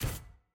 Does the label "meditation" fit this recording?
No